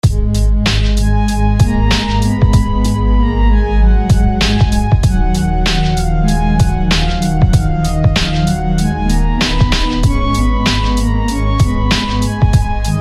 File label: Organ; Viper; SFB FX